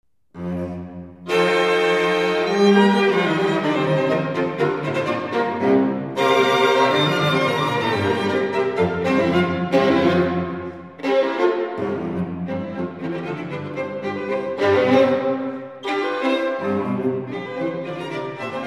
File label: String, E minor, Allegro moderato ala Polka